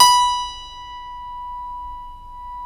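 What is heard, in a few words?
B4 clavinet left, Keyboards Acoustic; USB Soundscan vol.17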